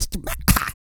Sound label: GRUNT, MALE HUMAN; Spectrasonics Vocal Planet